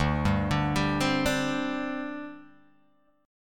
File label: D 7th Suspended 4th, down, Guitar, Open G